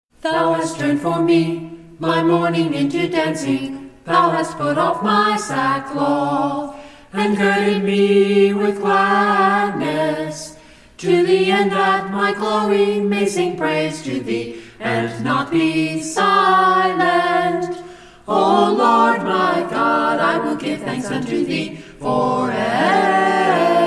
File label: a capella scripture song; psalm